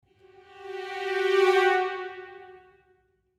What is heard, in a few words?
middle dissonant cresc